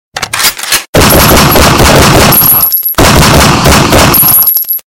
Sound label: Gun Shots Sms